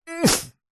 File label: human male short grunt through nose; zapsplat